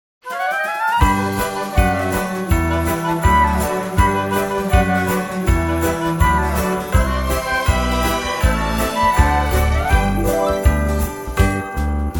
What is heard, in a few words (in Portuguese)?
saxofone